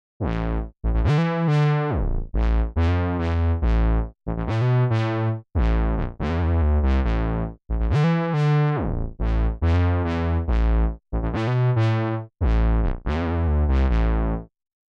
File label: Bass Minimoog